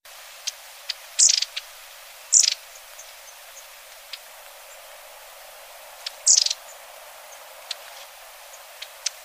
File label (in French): Mesange a longue queue